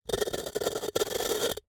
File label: Jar, terracotta, lid, rubbing, scraping, grating, rough, ragged, short; Eiravaein Works; Vaeyan IV